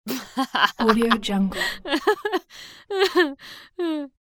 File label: woman laughing